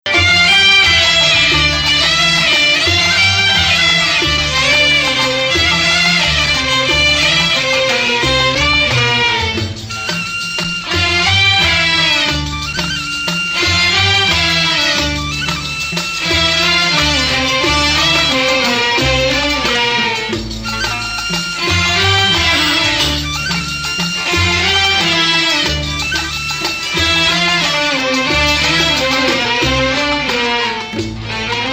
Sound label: Bayati Nahawand - Bayati, maqam